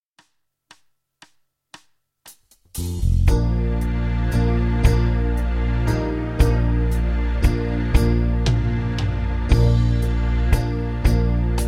Pastorale in Brass

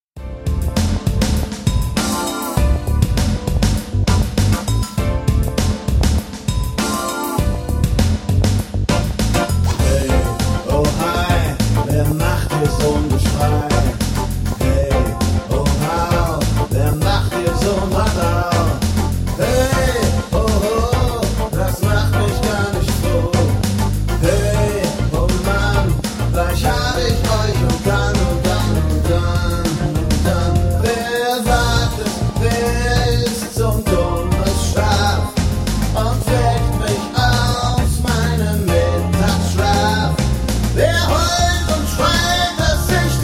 Rap

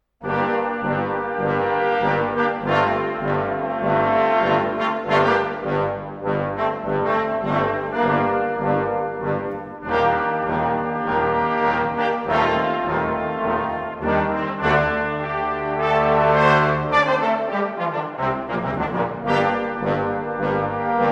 Funky Walkin

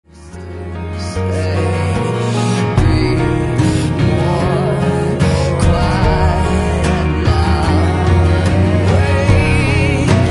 Quiet Interlude

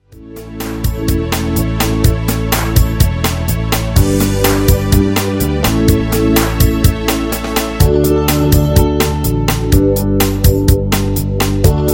Instrumentals

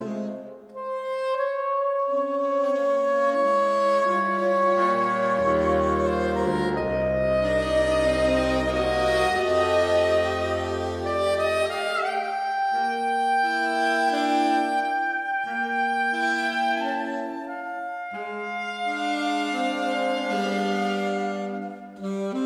Instrumental

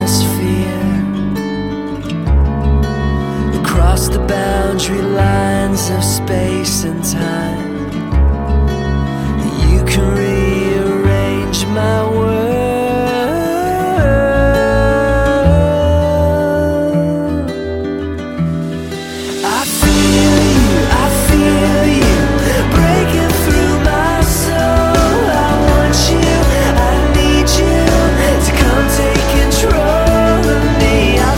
atmosphere

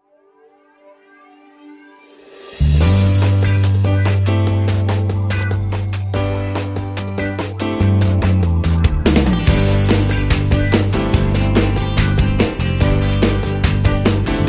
Vocals

